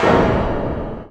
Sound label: drama pound; trilogy